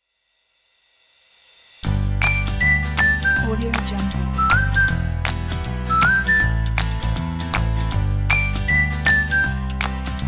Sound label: happy whistling